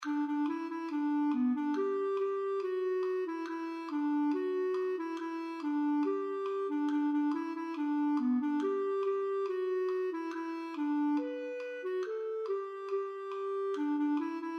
Schottis; efter Emil Carstedt

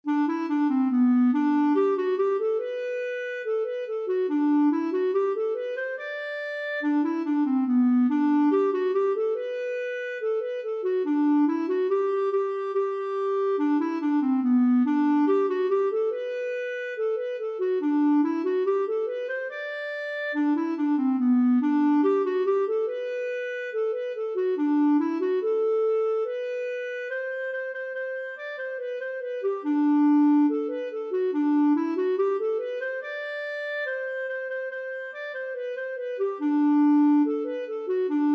Shottis fran Sko

